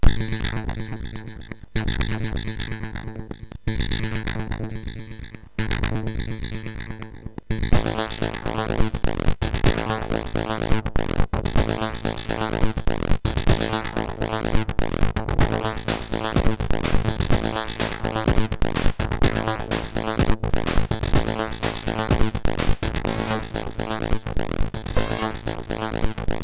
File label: Distortion; HVSC; MOS6581R3